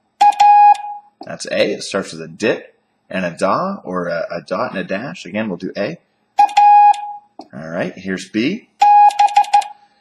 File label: Morse Alphabet